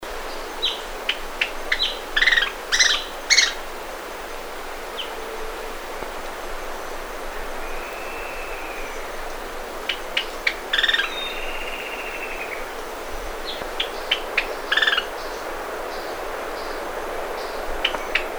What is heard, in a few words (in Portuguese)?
canto do ferreirinho de testa parda